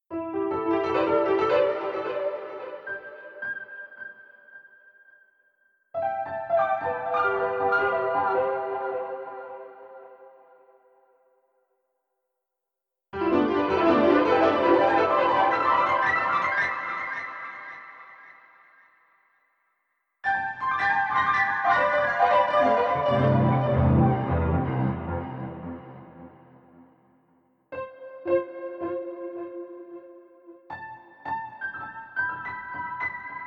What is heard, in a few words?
Waders piano solo; Life Over Marshland phrases